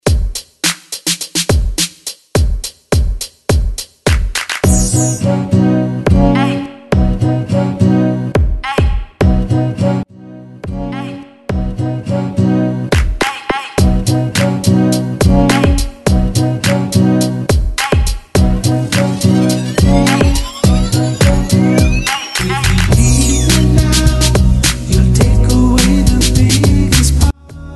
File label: Hyped Redrum 105 BPM